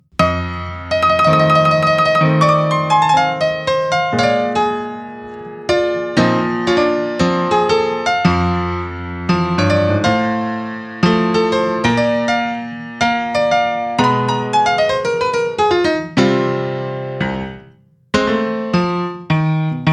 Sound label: old piano